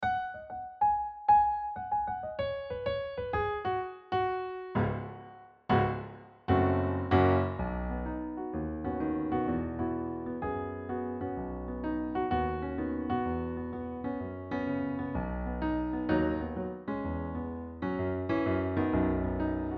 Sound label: Piano Track in B